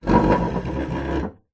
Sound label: chair dining heavy metal scrape drag wood floor; household